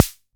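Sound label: Analog SR88 Snare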